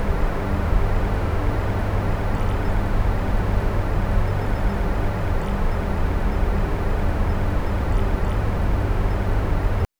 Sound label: small indian room ambienc